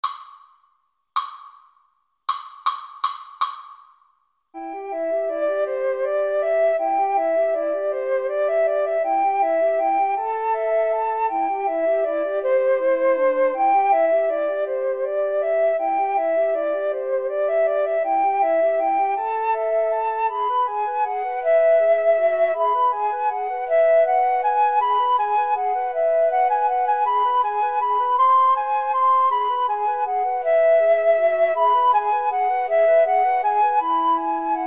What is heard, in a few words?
Flute Trio